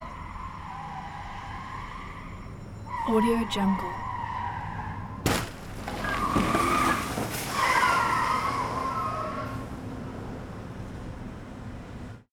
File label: vehicle crash